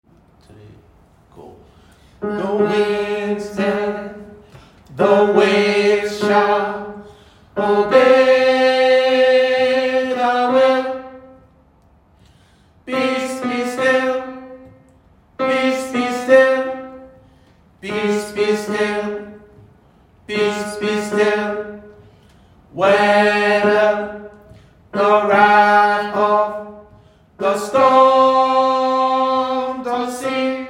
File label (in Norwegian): tenor, gospel; kongsberg; kor; gospel for alle